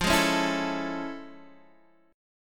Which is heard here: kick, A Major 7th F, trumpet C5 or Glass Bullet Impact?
A Major 7th F